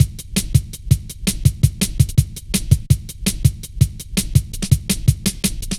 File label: light stepz, drum loops